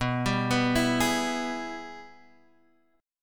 G sharp Minor Major 7th Sharp 5th B

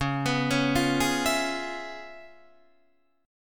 Db 7th Sharp 9th Db down Guitar Open D

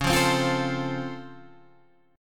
Db Augmented Major 7th, down, Guitar, Standard; strummed; JGuitar

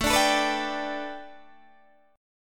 A 7th Suspended 2nd Suspended 4th Guitar Open D

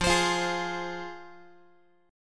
F Minor F down Guitar; strummed; x,13,15,15,12,x; JGuitar

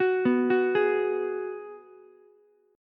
Ab Minor 7th down Ukulele; arpeggiated; JGuitar

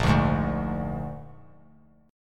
Bb 7th Bb down 3C,3F,3A,3D; strummed; JGuitar

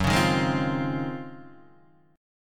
Eb Minor 13th Gb

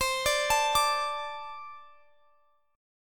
C 6th Suspended 2nd C

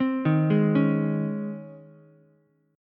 Db Major 7th Suspended 2nd Db Ukulele; DbM7sus2 arpeggiated; JGuitar

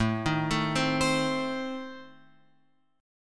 A Diminished A, chord shape

